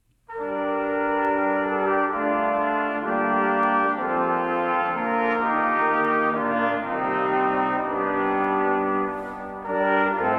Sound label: Fanfare Orchestra - Moderato